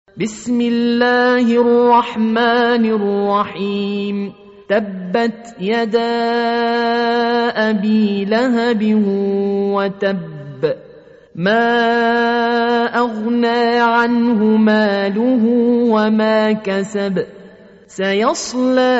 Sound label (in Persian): ترتیل قرآن کریم سوره مسد; احمد طرابلسی